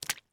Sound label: Step Water Splash; Footsteps; HauntedBloodlines; SFX Producer